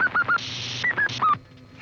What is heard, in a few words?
wrong button